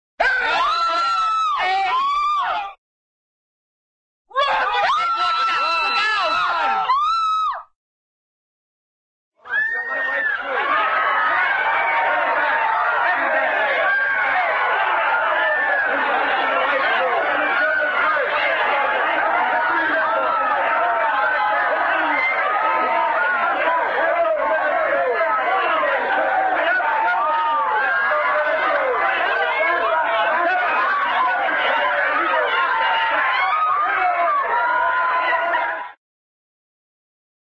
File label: crowd, panic screams and yells; cartoon